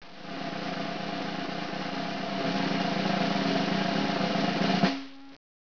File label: drumroll